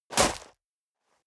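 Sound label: step leather shoes gravel medium jump, footsteps; schritte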